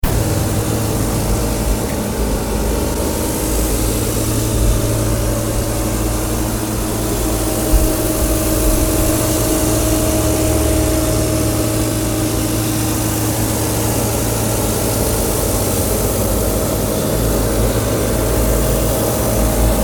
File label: generator